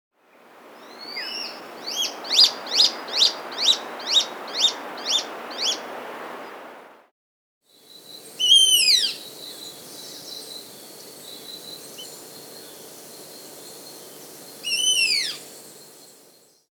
Olive-Backed Woodcreeper (Xiphorhynchus Triangularis), Andean Birds; S. America